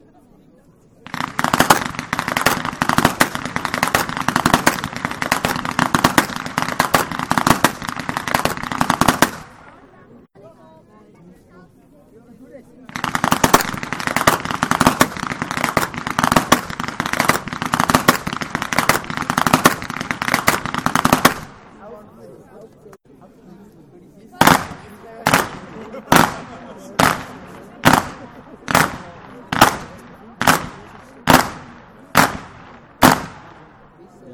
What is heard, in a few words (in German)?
schnalzen; saaldorf